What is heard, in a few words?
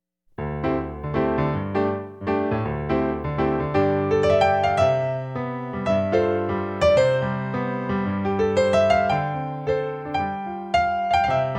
Solo Piano